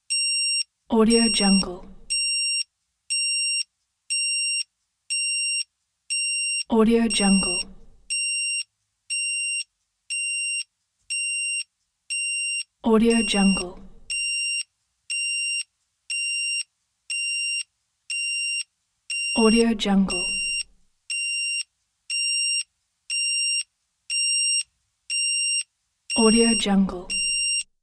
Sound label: alarm beeps; industrial sounds